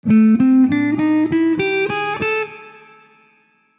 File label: Walnut pick; Tree Picks